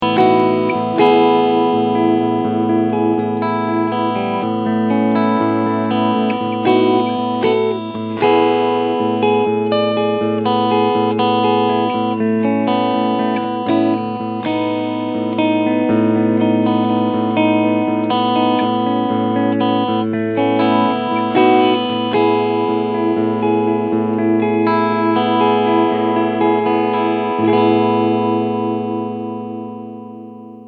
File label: Ibanez RGT1221PB DTF Clean Sound beide HB SC Mode